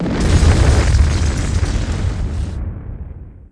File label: Building blast; groundwar